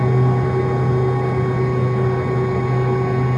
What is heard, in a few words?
Railgun Loop; Weapons